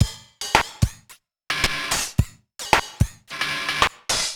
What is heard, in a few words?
Bent Korg MR-16 (110BPM), cyborg beats; musicradar